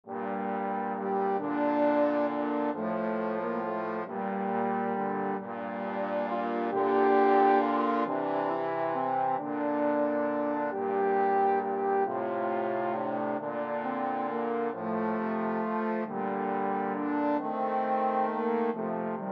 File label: scarborough fair trombone